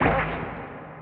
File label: bomb fire